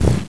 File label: magic missile; wesnoth